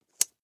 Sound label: nylon leather click